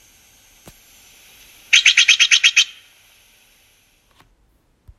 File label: stellers jay